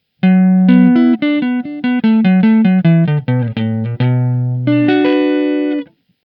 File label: clean